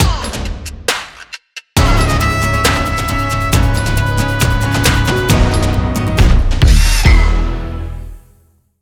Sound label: Template 136bpm; Wuerth